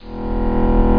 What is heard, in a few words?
fade on; abuse